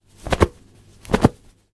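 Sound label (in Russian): звук встряхивания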